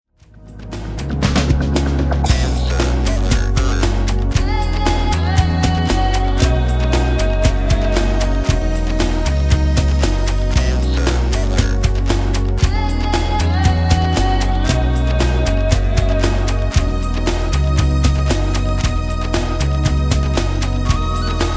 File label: Native Trance